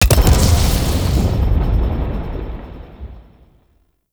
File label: Obelisk Activate